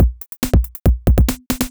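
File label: DRUMLOOP ELECTRO 140; Techno2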